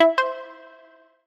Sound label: sfx Button select